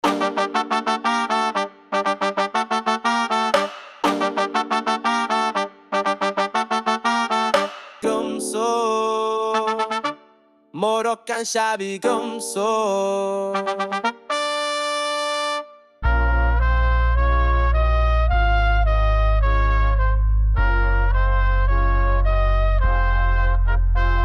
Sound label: samba moroccan